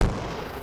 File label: tututu; fish; tower client